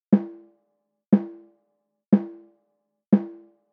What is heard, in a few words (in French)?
Caisse Claire Effet Carton et Resonances